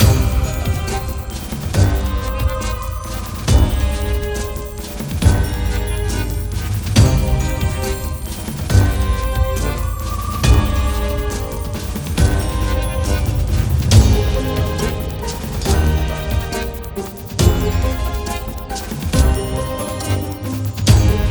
Idle LOOP; RatWorld

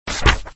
Page Sound

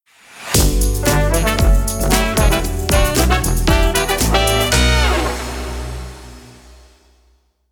Battle Hub Tournament - Victory; Street Fighter 6 Beta; Xbox Series X/S

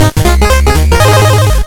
victory jingle; Bonnie; curse crackers for whom the belle toils switch windows gamerip 2022

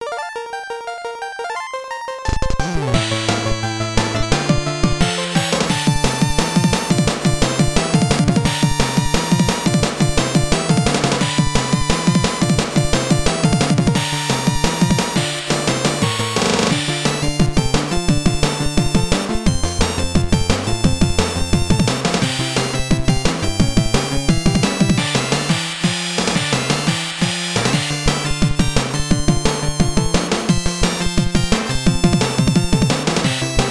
BGM, WonderSwan Color; X -Card of Fate

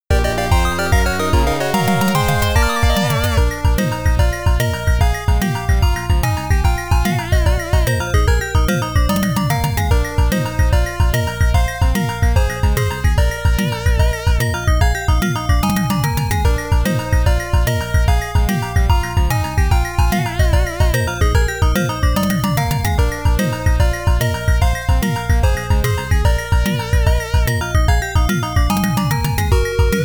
Fanfare B; Melty Lancer; PC-9801

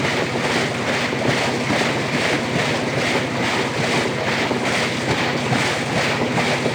Steamboat Paddle; Bayou; Music Riverboat; Steamboat Paddle Slow

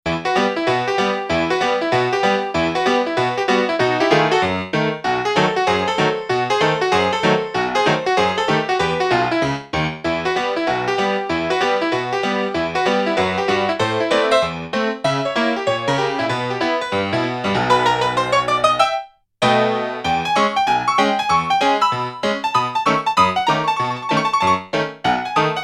Piano Melody, Piano Rag; Pixel Gun 3D

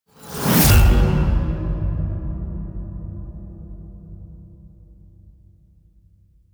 Cut Scene Stingers

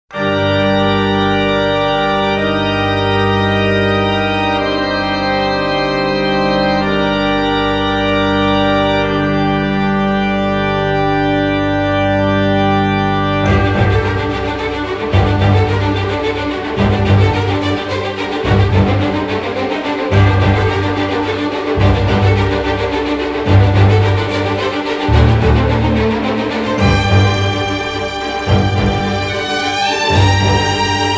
Boss Battle Intro

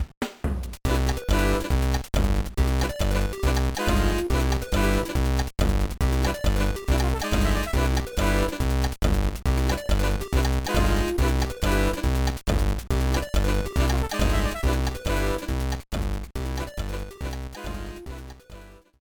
Bonus Chance; Yoshi Topsy-Turvy

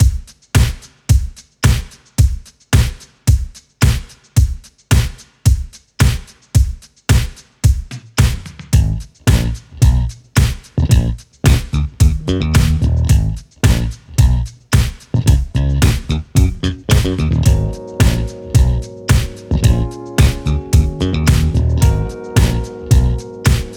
Rhythm Theme (110 BPM); Yum Yum Cookstar

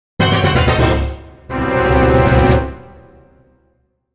Mission Failed; Pokémon Black and White

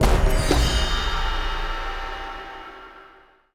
Stealth Loop END; Bolt

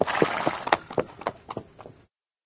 Horse Knock Hoofs

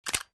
Pen Charge

